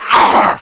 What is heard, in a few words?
fall